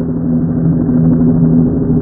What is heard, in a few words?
Copter; CanDo!